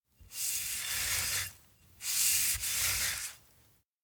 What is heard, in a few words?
paint wall rhythm; baker; anemoi